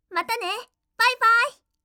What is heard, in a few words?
windows logoff; Madobe Ai